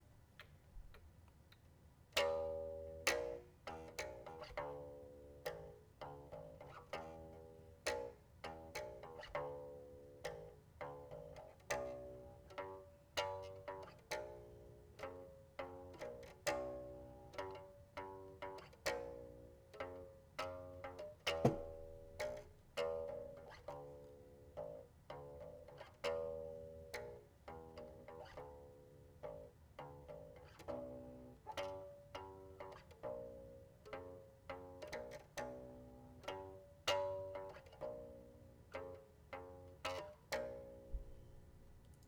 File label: bass